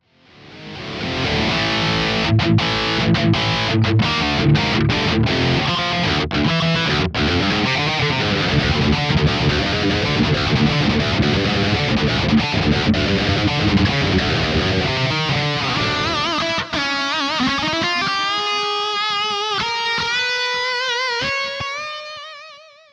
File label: METAL